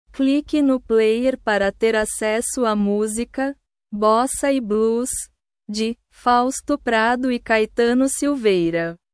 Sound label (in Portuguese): bossa e blues